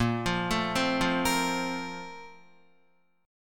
Bb Major 7th Suspended 2nd, Guitar; BbM7sus2 arpeggiated; JGuitar

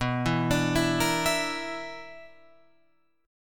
B Minor Major 7th Double Flat 5th, Guitar, Open F; BmM7bb5 arpeggiated; JGuitar

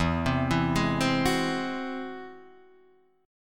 G sharp Augmented 7th, chord

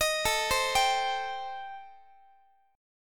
A-Minor 7th Flat 5th, Guitar, chord; Am7b5; arpeggiated; x,x,18,19,16,18

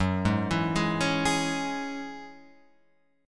Gb 7th Suspended 2nd Gb down Guitar Open F; arpeggiated

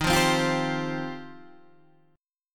G 7th Suspended 4th Sharp 5th

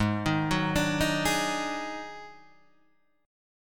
Eb Minor Major 7th Double Flat 5th

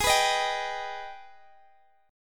Ab Minor Major 7th chord, Guitar, Drop D tuning; strummed; x,x,18,16,16,15; down

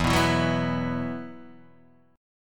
E Suspended 2nd; x,7,9,9,6,6,6,x

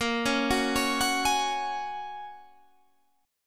A sharp Minor 7th Sharp 5th; 18,16,16,18,19,16; arpeggiated

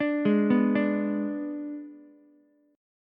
Ab Diminished Ab, Ukulele; Abdim arpeggiated; JGuitar

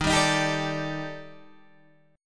Eb Major Sharp 11th Eb Guitar Half Step Down

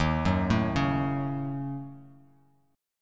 Eb Minor 7th Flat 5th, Bass Standard; arpeggiated

